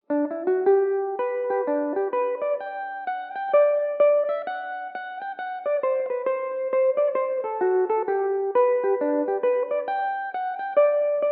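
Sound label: G major belmuse, irish mandolin; The Hangman's Rope in this new setting; Belmuse EM-190 electric mandolin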